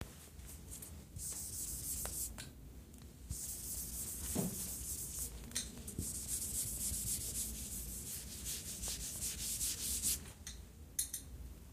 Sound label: whiteboard